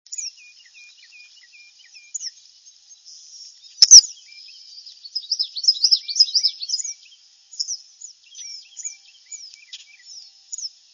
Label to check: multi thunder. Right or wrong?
Wrong